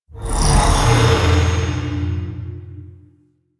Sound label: Teleport; Warframe; Quests; TheNewWar; Ambience; Drifter Camp; Ambient Gameplay; Liset